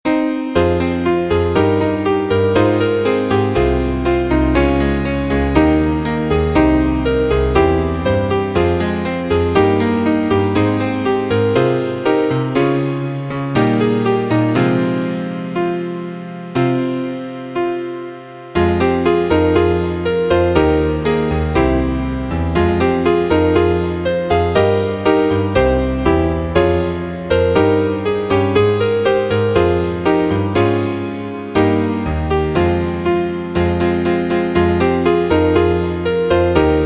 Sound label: innocent piano